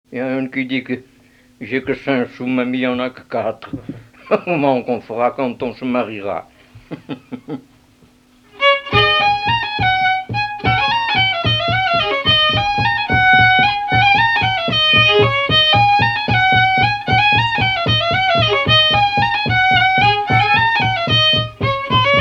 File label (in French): Bourrée; N'ai que cinc sous